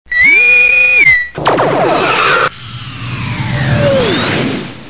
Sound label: new msg